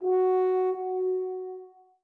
orbit hornsolo; Sky Children of the Light gamerip

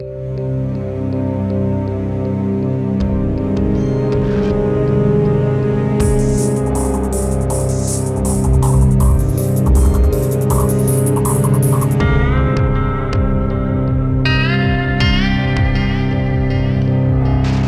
Lobby Music; Driver Renegade

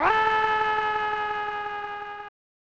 scream; snes

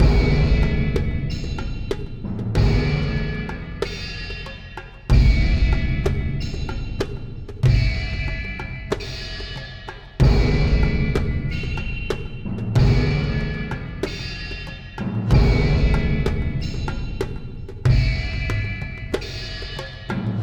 Puzzle Loop

